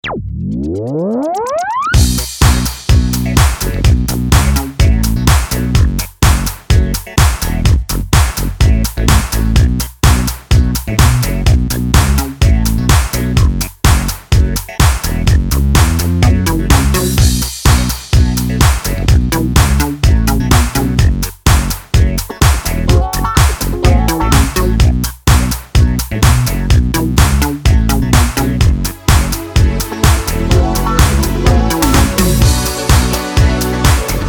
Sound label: give up instrumental